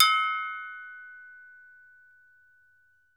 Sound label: TOKE BELL, African Percussion; E-MU Producer Series Vol. 7 – Old World Instruments